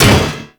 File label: Drone Fall Impact; Grineer